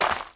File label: gravel walk